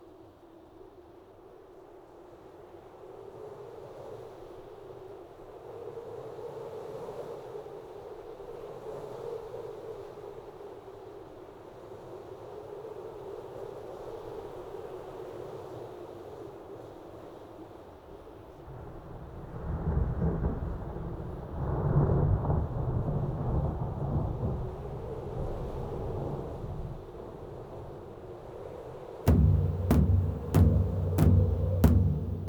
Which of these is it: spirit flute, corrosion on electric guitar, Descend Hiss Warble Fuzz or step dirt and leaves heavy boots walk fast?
spirit flute